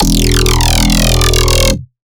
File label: Bass Shot E Scraper, Dubstep; Ghosthack; Advent Calendar 2018